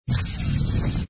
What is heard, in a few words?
jetpack Charge; robot collector